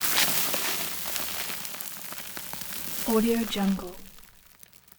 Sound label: foley sand sand spray falling debris